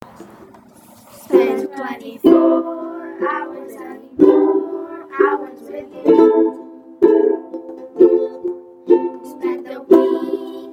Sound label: Uke